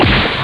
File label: snare drum, percussion instruments; Sound Effects for Multimedia - Volume 1